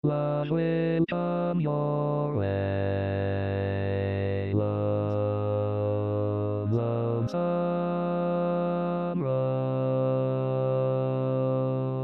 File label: Bass, Tag